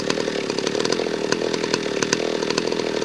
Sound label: IDLING